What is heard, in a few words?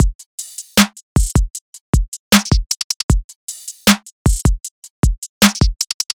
Full Drum Loop - 155 BPM; Cymatics; Astro Sicko